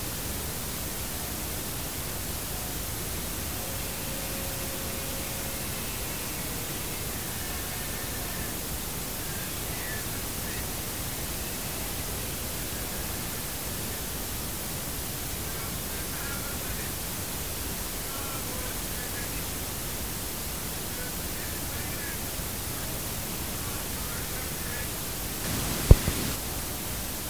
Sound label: compressed blank tape with blip